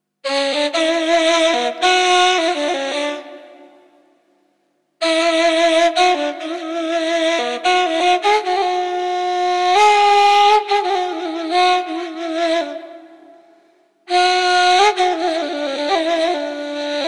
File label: music instrumental ney